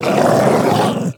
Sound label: Infested Wolf Play